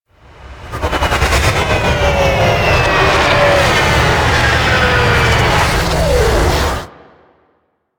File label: Crp Ship Fighter Thruster Charge Land C; Ambience; Props; Warframe; Corpus Ship Remaster